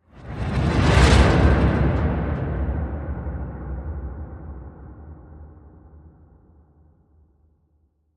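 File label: Whoosh Train Car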